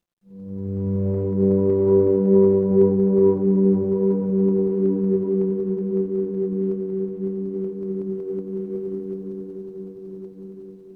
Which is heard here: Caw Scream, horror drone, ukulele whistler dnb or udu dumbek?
horror drone